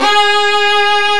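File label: ARCO violin, Solo String; E-MU Producer Series Vol. 2; More Studio Essentials; Composer; Solo String Combo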